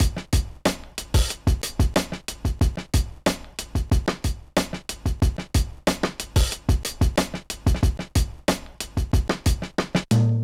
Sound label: Chopstick, Drum Loops, Minimal; Zero-G Smoke